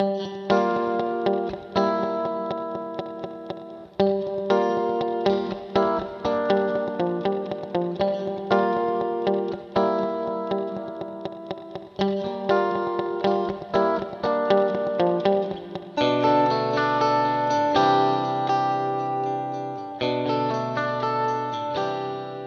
Guitar Yamaha Rev7 Large Hall Vintage Digital

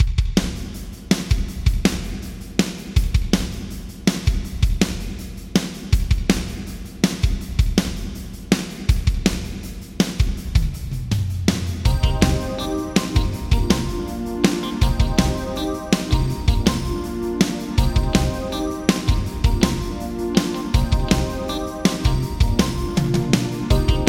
Drums Yamaha ProR3 Large Hall 2 Vintage Digital

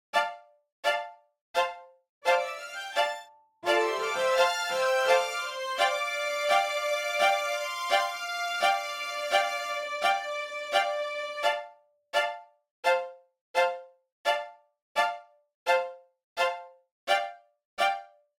Strings Dry; Audio Ease Altiverb XL plug-in is a convolution reverb

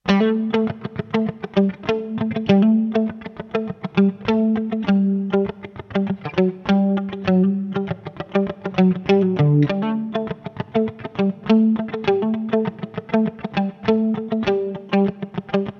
Picky Guitar Wet

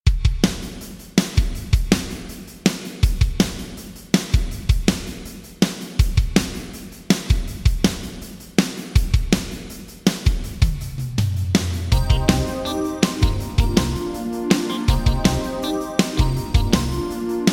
Drums Roland SRV 2000 Plate Vintage Digital

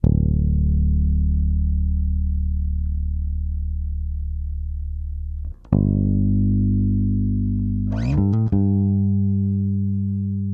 Bass Dry

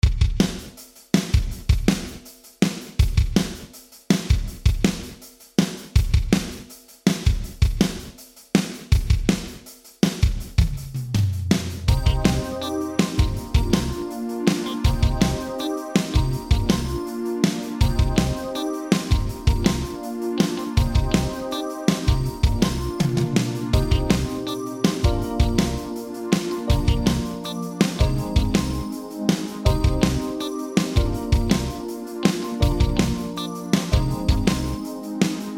Drums Eventide H8000 Gated Plate Vintage Digital

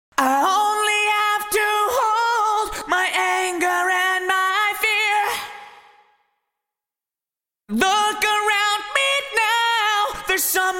Vocals Lexicon 300 Stairwell Vintage Digital

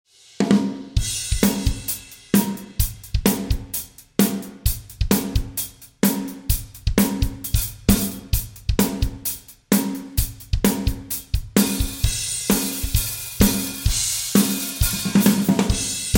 Drums Scoring Stage; Audio Ease Altiverb XL plug-in is a convolution reverb; 20th Century Fox

